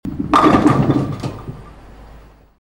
bowling pins strike sound

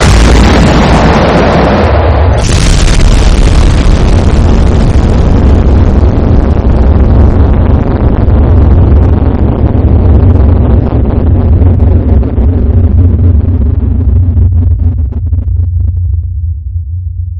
slam

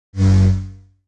lightsaber whoosh

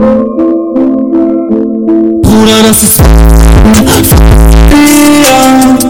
bass loud; fivecity

